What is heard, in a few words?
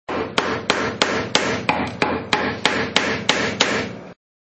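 chisel mallet; wood chisl; chisl mallet new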